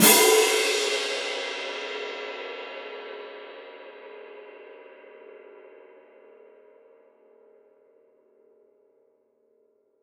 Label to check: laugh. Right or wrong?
Wrong